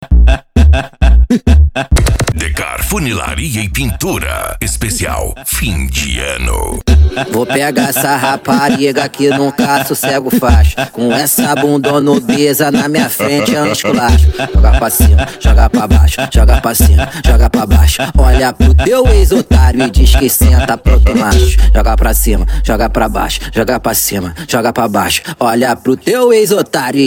funk ritmado

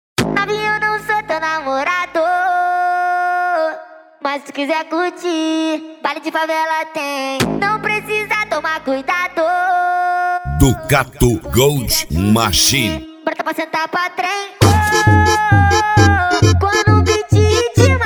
especial funk

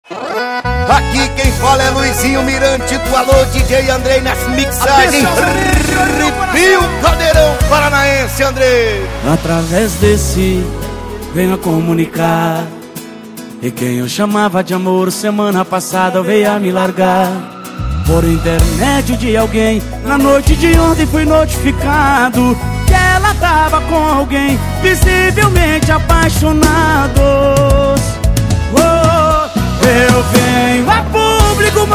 sertanejo com locutores de rodeio

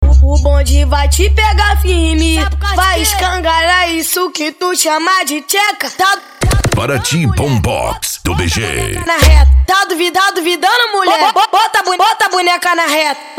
bombox funk

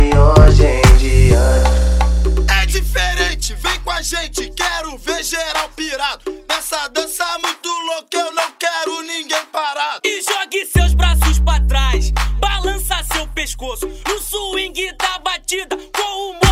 funk mega funk

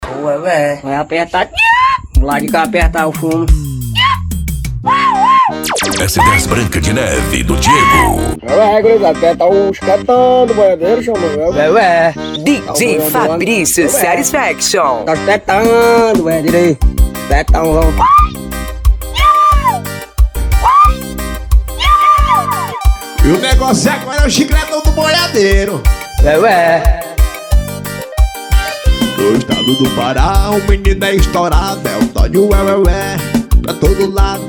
esp forro

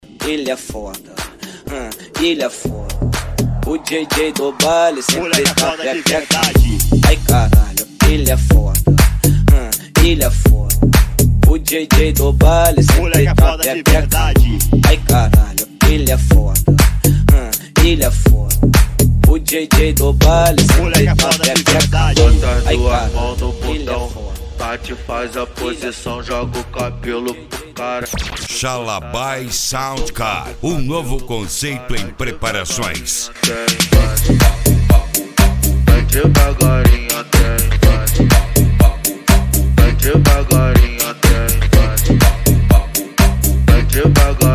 sound car mala aberta e mega funk

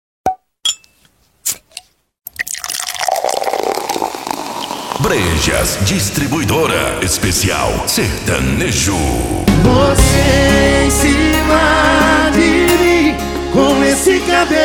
esp sertanejo; brejas distribuidora

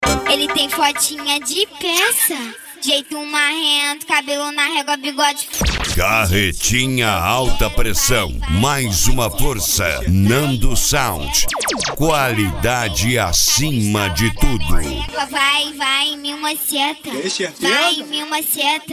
ritimada agressiva

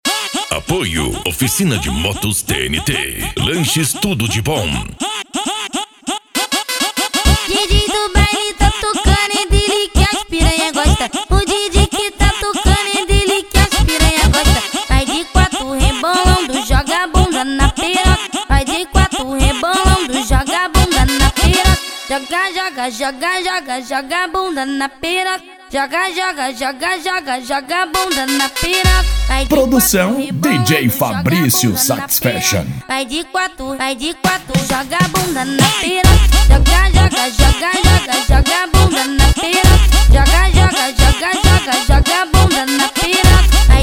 funk e lambadao

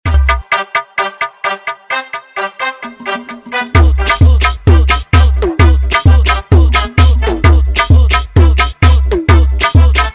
funk agressivo ritmado e funkadao

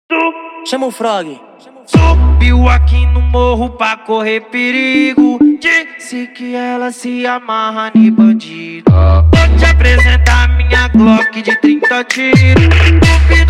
pancadao funk bass

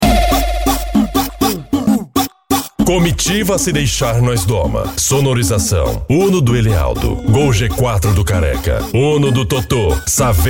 funk bass

